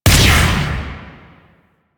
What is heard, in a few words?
Pistol Charged Shot; Weapons; Warframe; Drifter; Operators